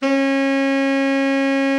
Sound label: TENOR, tenor sax; Best Service; Killer Horns